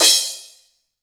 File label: Cymbals crash; Vengeance Samples